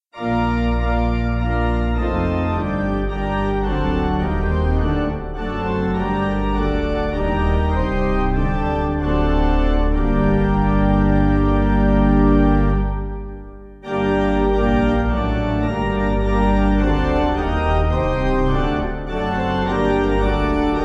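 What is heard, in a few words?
pipe organ, hymn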